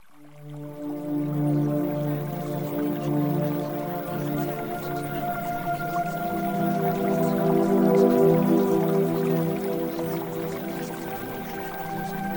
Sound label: Music; Premium Subliminals; Success With Men